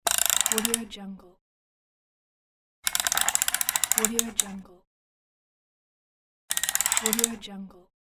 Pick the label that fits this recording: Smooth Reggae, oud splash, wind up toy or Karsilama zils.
wind up toy